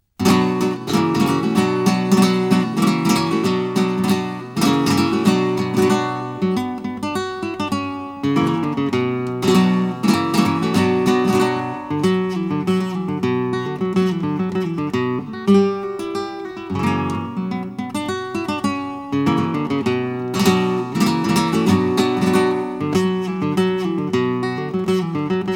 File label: sevillanas, chitarra flamenco; Playgame Music